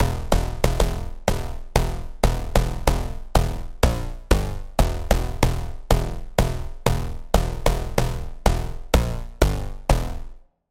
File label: Bass; Routed Sound; Spire Expansion - Doom Carpenter Vol.1